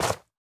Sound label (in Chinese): 灵魂土踩踏声